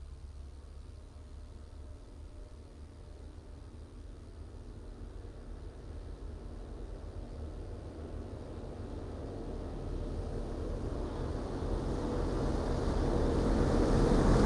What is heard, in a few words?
tractor case ii cx90 exterior medium speed passby stereo; sonniss gdc